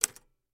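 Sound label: Typewriter Single Key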